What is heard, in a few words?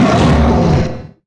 Damage; Dagon; Mob